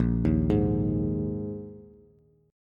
Bb 7th Suspended 4th Bb Bass; arpeggiated; JGuitar

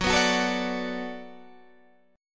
D 6th Gb, chord

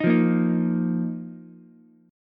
A sharp Suspended 4th Ukulele; strummed; JGuitar

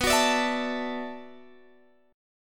B Minor 11th B down Guitar Open G

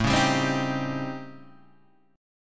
A 7th Sharp 9th Flat 5th; A7#9b5 Chord {5 4 5 5 4 3} strummed

